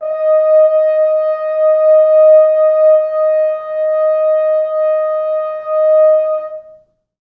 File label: 4 FrHorns; D#4; East West; Quantum Leap Horns Sax; 4 FrHorns MOD